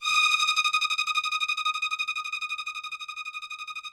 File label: ROUGH STRNGS, Yamaha VP-1; Music Store